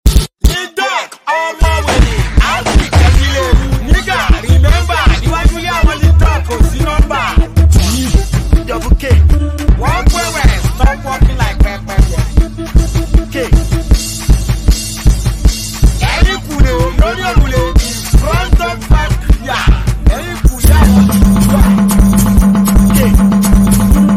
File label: Beat